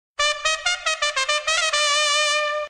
Ipl Trumpet Ringtone

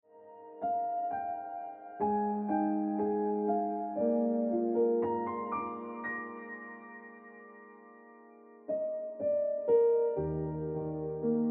Like A Radio Song Ringtone Piano Music

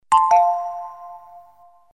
Bell Ringtone

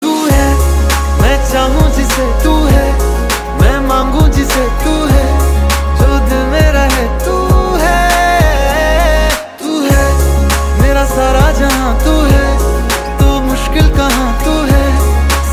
Hindi Ringtone

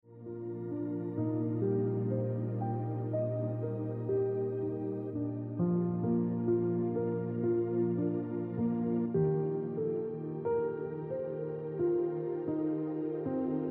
Piano Music

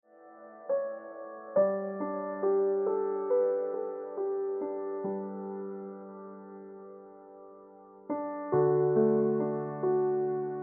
Ringtone Piano Music

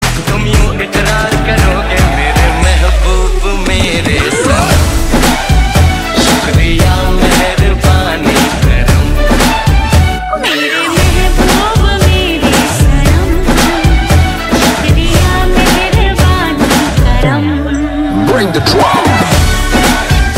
Ringtone

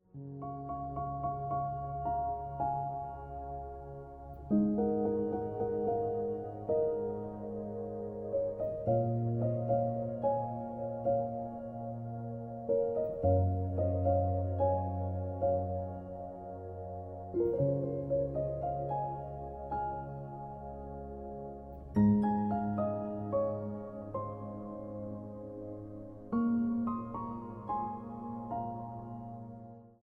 Celestial Ringtone

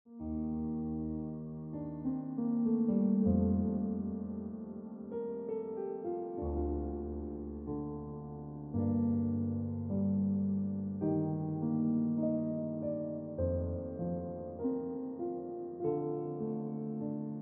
Piano Music

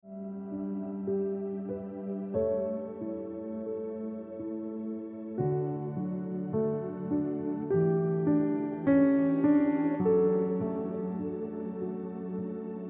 Look Back On Ringtone Piano Music

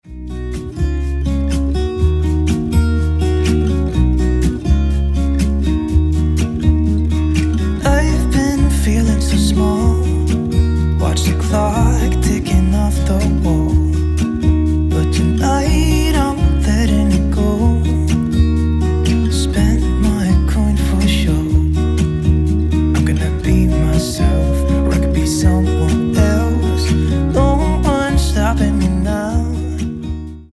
Acoustic Version

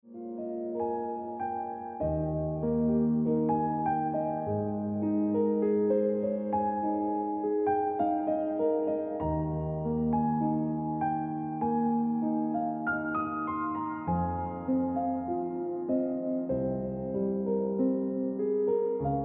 Deep In Mind Ringtone Piano Music

